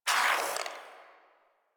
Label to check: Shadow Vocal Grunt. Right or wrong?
Right